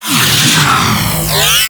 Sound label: Grenade Launcher Proj; Thano Tech